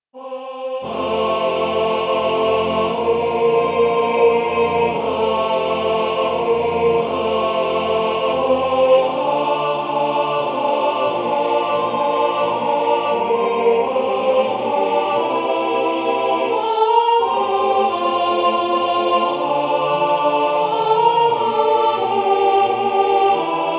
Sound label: sleep well alt